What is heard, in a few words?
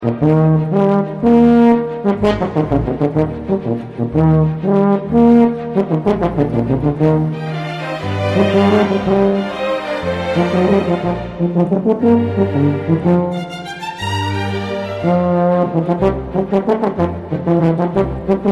Horn